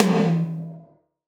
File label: Tom, Gated Reverb; musicradar